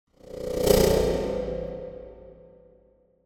Whoosh Stutter Fast Pulsate Fear Pass; Hollywood Edge; sound designer's toolkit v2

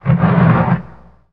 Menu Horror; Menu FX

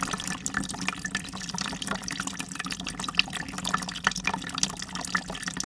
water filling; best service Blue Box; water fx

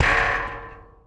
Street Ball Metal Rim, Street Basketball; SFX Kit

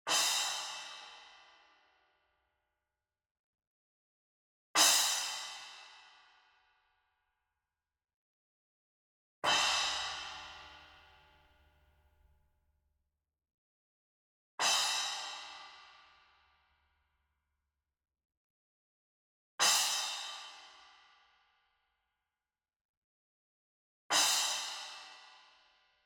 CYMBAL Splash Hit Soft Beater Medium; boom library; Cinematic Strikes Construction Kit

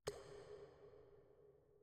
Water Drops Single; Inquisitor 2

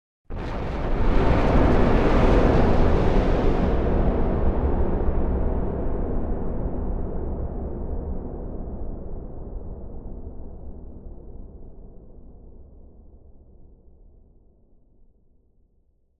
Creak Moan Distant Long Abstract Creaks; Hollywood Edge; sound designer's toolkit v2

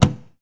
Ferrari Dino Hood Close, Automobiles

